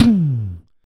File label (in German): TomTom Hoch